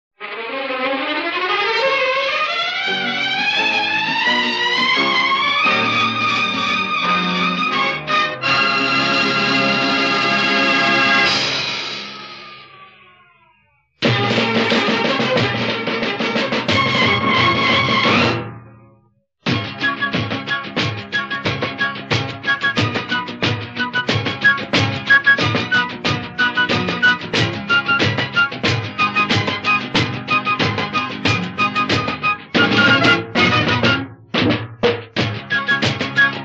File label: Theme Instrumental; Mr. X In Bombay